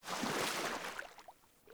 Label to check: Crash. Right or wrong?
Wrong